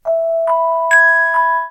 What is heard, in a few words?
musicbox, notificaciones